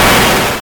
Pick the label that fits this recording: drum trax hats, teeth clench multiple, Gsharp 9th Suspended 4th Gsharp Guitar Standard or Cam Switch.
Cam Switch